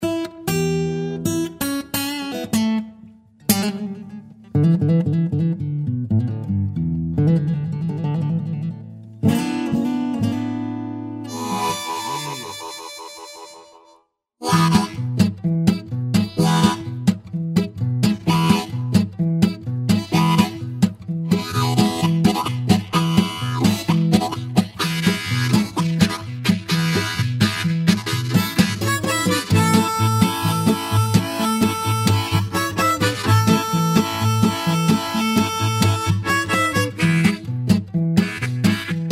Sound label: Blues